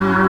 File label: chords